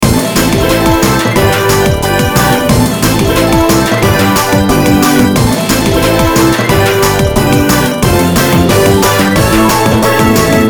Bonus Night

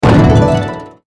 Explosion; Moon Motar

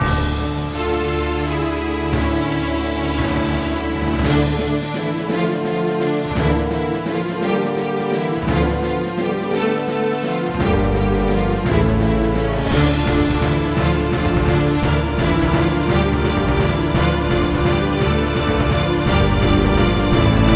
Bgm map; ovenbreak

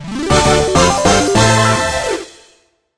Loading; Alpha Run

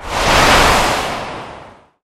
Transform Start; Soda

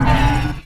Transform End; Vampire

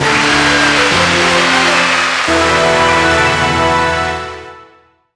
Result Guild Reward

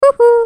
slide

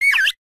slide

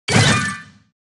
slide; Blue slush cookie

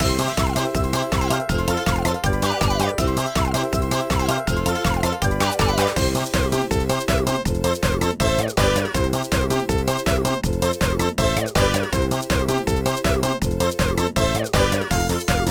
Bgm map faster

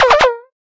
slide

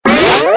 Transform Start; Lime

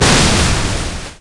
Cannon Explosion; Captain Ice

